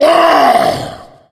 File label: tank fire; Left 4 Dead; Source Engine